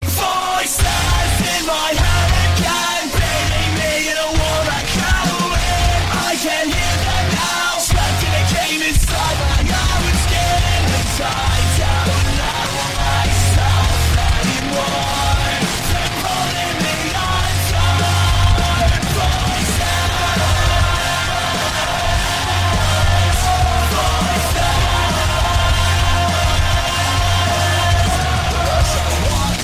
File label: Voices